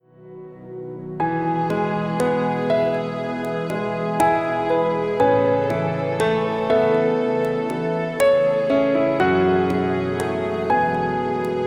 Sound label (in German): Entspannungsmusik mit Klavier